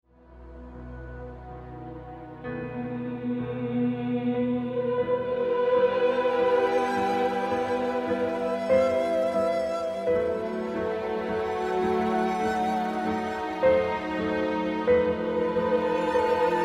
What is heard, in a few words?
E Minor Prelude